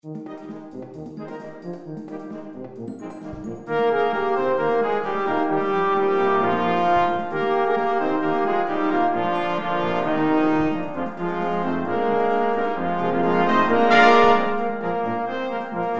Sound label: brassband